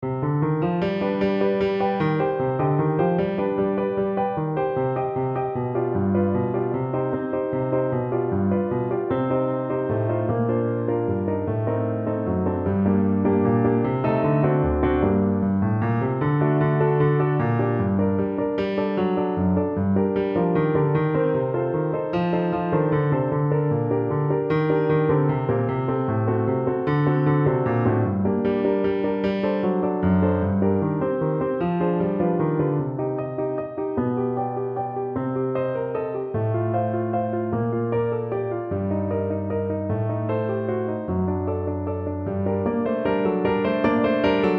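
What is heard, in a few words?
Danza, danza, C minor, Accomp, faster tempo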